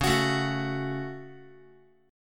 Db Suspended 4th Sharp 5th Guitar 4A,4E,5A,5D,5G sharp,6C sharp; strummed; JGuitar